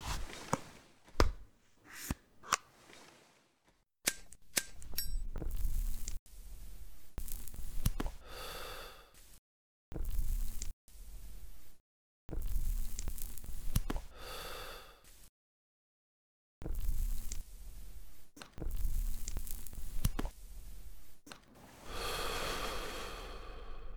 cigarette; Enjoy Cigarettes; enjoy cigs

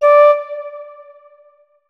flute notes; Hideout Furniture

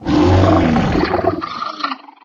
enemy, monsters; Redone Pripyat and Juipiter; izlome

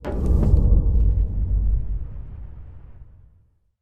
rogue new level

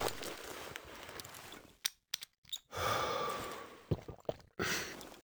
anabiotic, item usage; FDDA Enhanced Animations - Food and Drinks

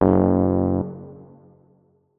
notes, keyboard, electric piano

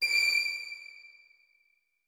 notes, keyboard; Hideout Furniture

